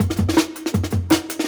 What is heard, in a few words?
162, Jungle Breaks; Original Jungle Breaks